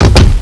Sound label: STAMP